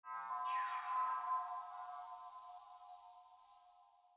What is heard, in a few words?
ambience room necromastery magic layer